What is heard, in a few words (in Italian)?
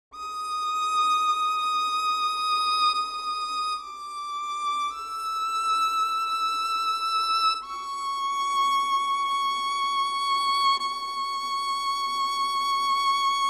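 viola solo con sordino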